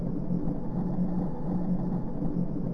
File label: machine; quake2